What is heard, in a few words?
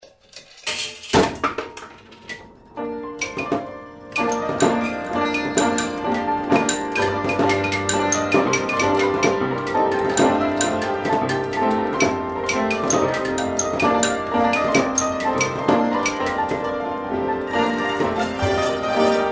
Orchestrion